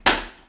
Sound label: snap